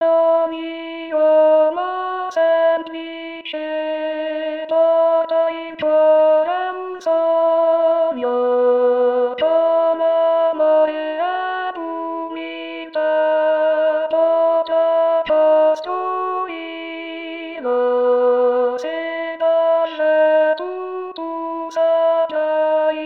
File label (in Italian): Voce